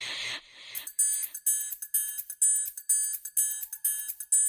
Treble

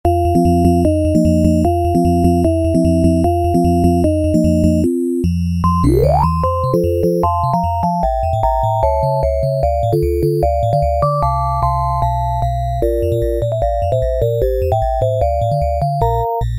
8 bit; Blend s

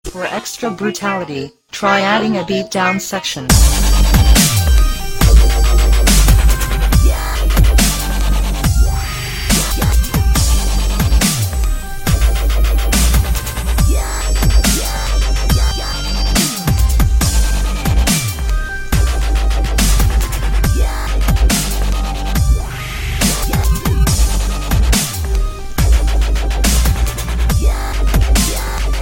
Strong Dubstepp

